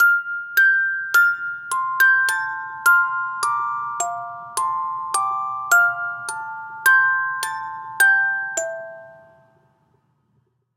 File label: Music Box; Assassin's Creed Syndicate